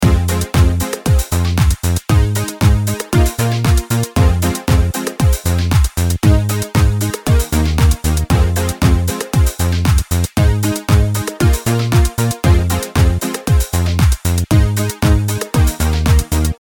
edm music ringtone

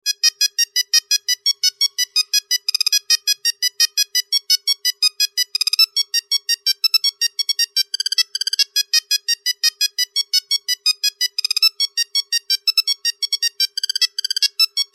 nokia ringtone; critter

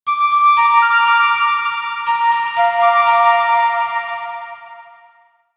echo flute

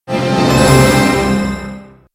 digital signature tone

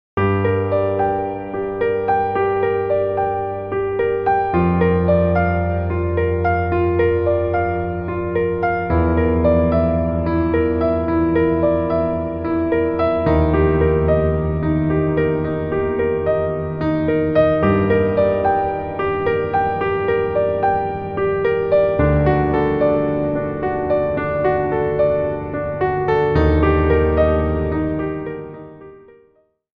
sad piano song

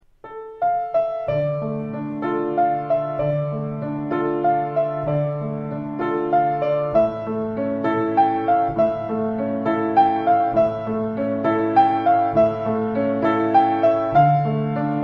piano; total recall

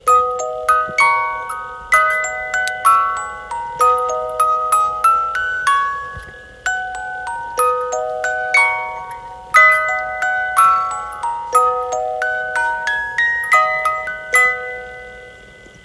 music box

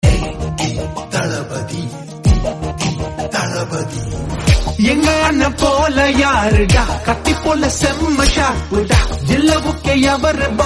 Tamil Song

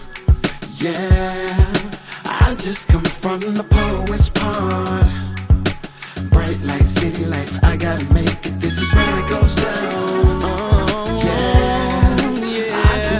power ringtone

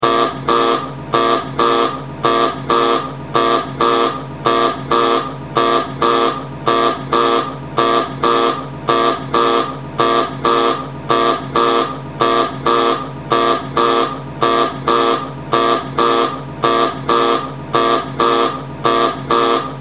navy ship alarm buzzer

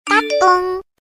korean ringtone

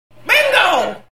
sms bingo sound

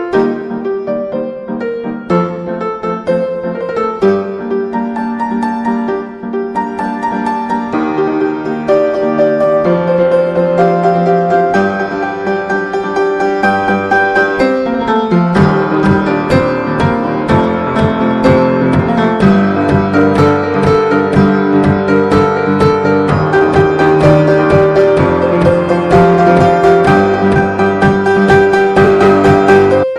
Piano Ringtone

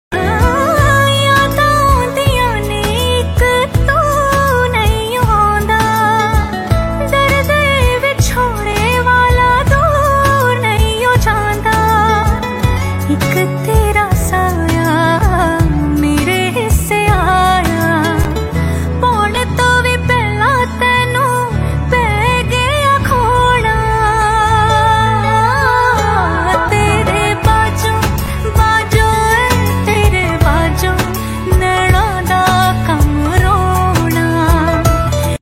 Female Version Ringtone

